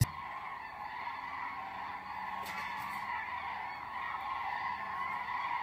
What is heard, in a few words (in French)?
Cris Des Grues; lemotion ne senregistre pas